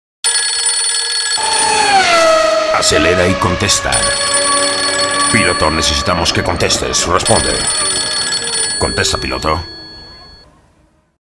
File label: RINGTONE